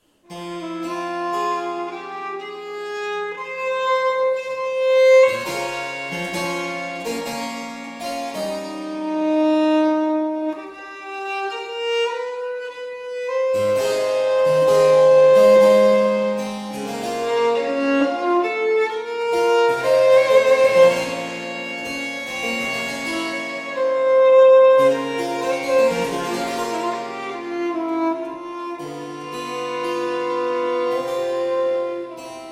Sonata No 2 in A Major- Grave e piano, English Harpsichord; Joseph Gibbs